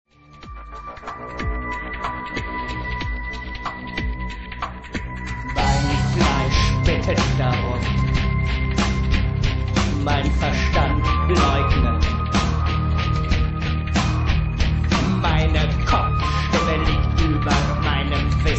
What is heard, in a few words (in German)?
kopfstimme